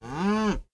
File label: cow